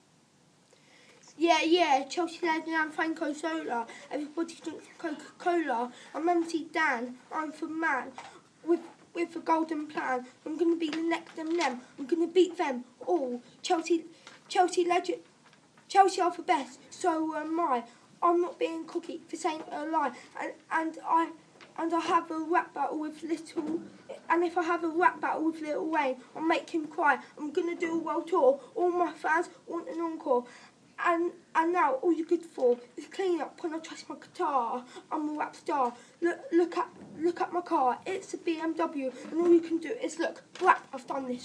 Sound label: rap